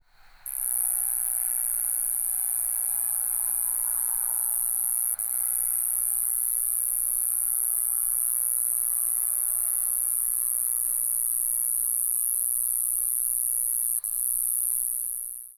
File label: Broad-tipped Conehead